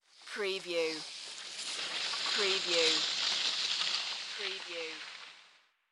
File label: Skiing, Snowboarding, Coming to a stop, distant